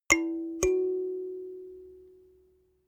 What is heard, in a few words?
notification kalimba second up